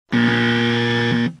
wrong; cave

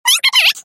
clock

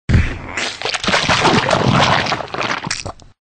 splat

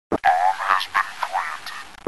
bomb plant

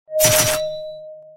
attack ping

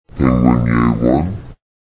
penguin yay

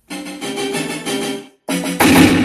jingle; cave

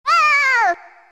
despair; toad

